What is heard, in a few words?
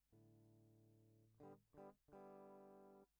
Guitar